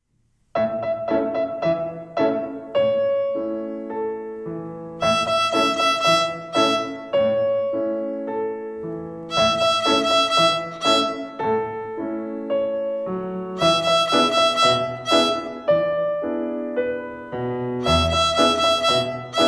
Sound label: Rhythm on E